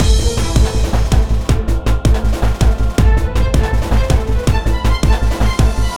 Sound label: Intense BGM; The Sexy Exorcist; Xbox 360